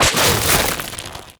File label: barnacle grab player; Half Life Alyx